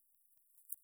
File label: Myotis myotis; europe